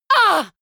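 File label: female combat hit hard, screams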